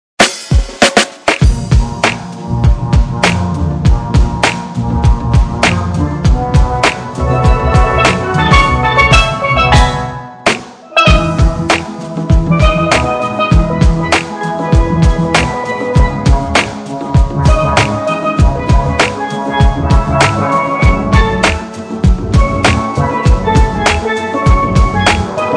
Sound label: steel dub